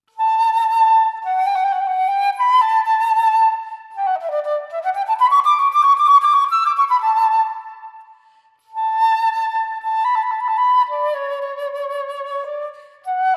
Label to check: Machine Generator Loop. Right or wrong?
Wrong